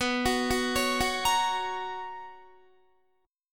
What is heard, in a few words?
B Major 7th Suspended 2nd Guitar, chord